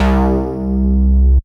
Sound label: C Bass; Electronisounds; Vibration Expedition One Shots